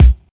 bassy bass drum sound b key, Royality free kick drum sound tuned to the B note